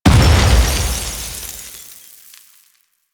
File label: Grenade Explosion; Kahl Veil Breaker; Warframe; Quests; Kahl Abilities